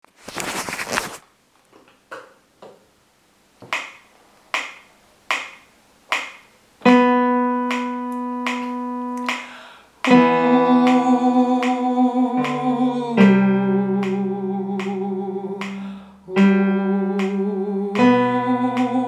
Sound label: tenor